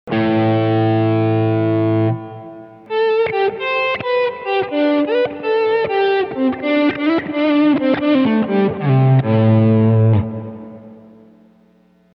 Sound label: mobius autoswell fastswell